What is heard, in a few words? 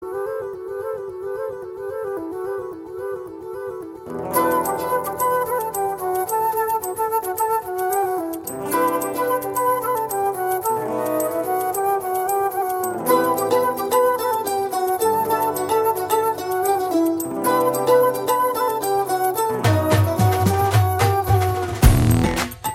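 background music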